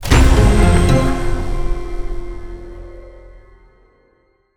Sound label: win large level; netent